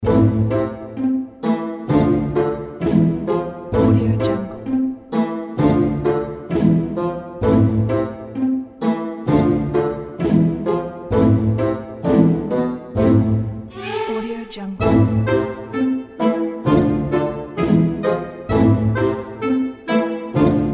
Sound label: funny; eightballaudio